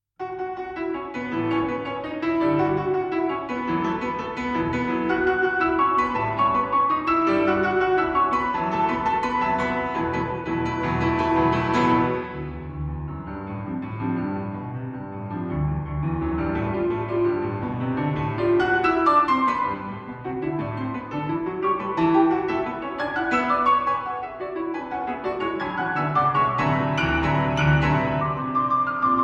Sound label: Piano Sonata, Allegro Molto